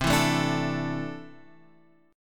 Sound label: C 6th Add 9th C down; strummed; JGuitar